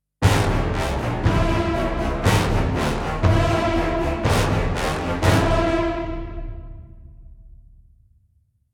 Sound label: Epic Orchestral Stinger